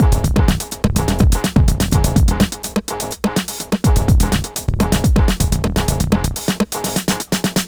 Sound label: Hardcore Breakbeat, 125 Emin; Big Fish Audio; Hardcore Breakbeat Action; Marsattax